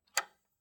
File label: deadbolt lock; soundscrate